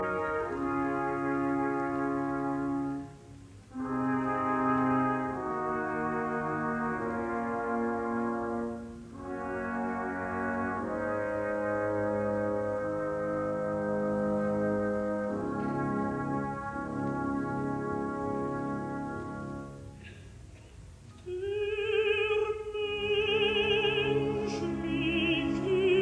Symphony in C minor; Urlicht; Symphony No 2; Resurrection; Bruno Walter

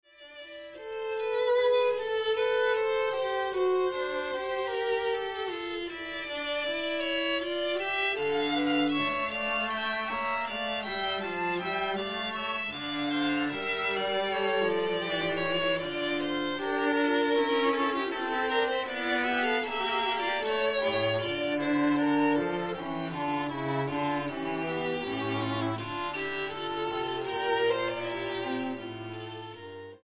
Prelude in F Major

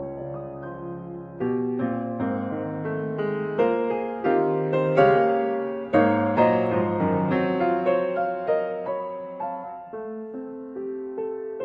Piano Sonata Energico; Sonata Brahmsiana

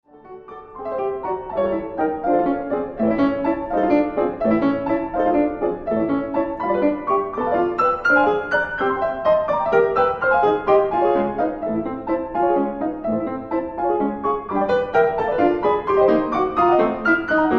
Preludes for piano Allegretto

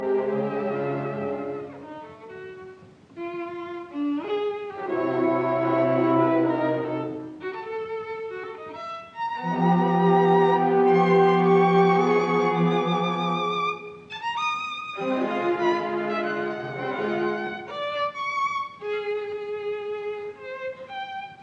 Violin Concerto Poco allegro; Schoenberg; Op 36

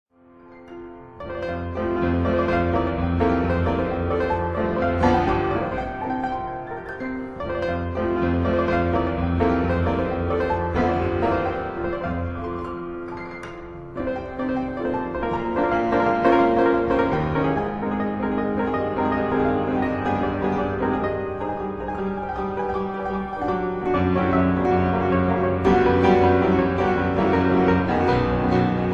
Etudes for piano in F major

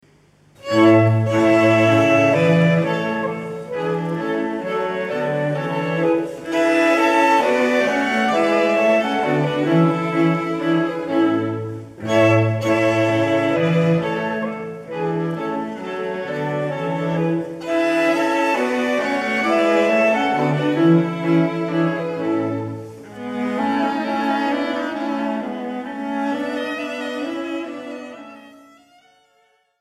Clarinet Quinet in A Major; Mozart; K581; III. Menuetto-Trio I-Trio II

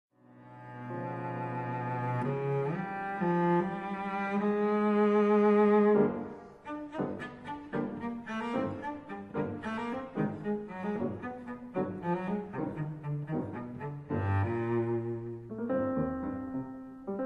Cello Sonata for cello piano Allegro appassionato; Barber; Op. 6; III.

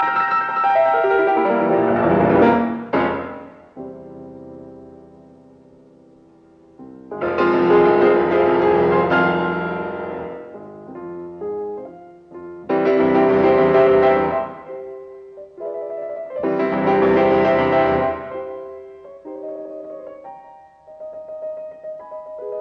Piano Sonata in F minor Allegro assai; Beethoven; No 23; Op 57; Appassionata; Walter Gieseking

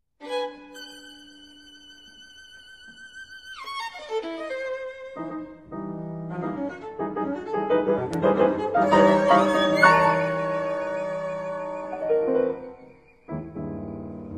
for piano, violin & cello Agitato; Mamlok; Panta Rhei (Time in Flux)

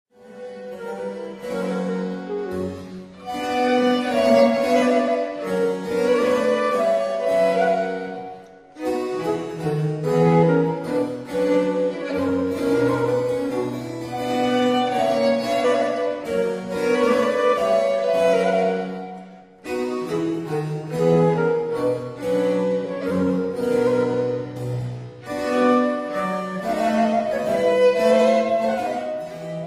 for 2 violins & continuo, Rondeau; L'Espagnole (La visionnaire); Les Nations

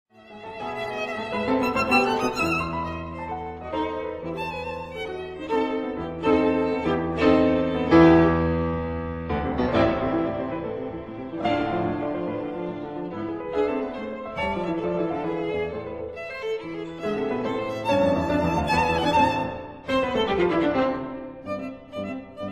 Sonata for violin & piano in A minor, Presto

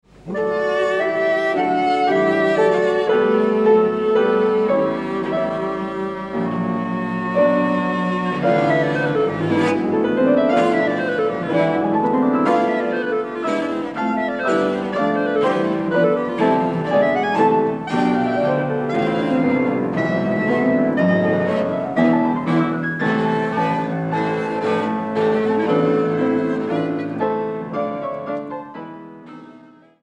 Trio in a for Clarinet Cello and Piano, Allegro; Brahms; Op. 114

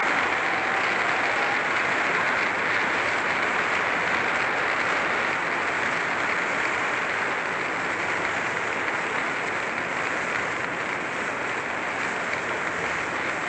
Applause; Symphony No 9 in D minor Op 125 Choral; Arturo Toscanini

